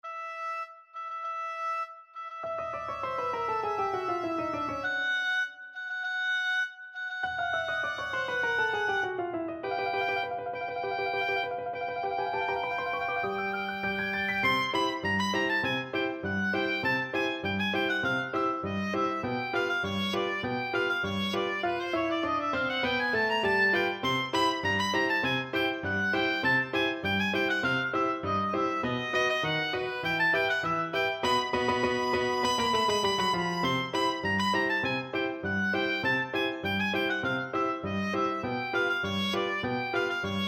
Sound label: Fucik Entry of the Gladiators, oboe